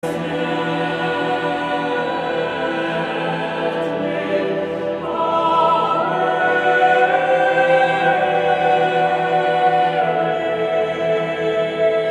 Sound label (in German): Vokalmusik